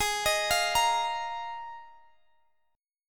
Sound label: Bb Suspended 4th Ab, chord; Bbsus4/Ab; arpeggiated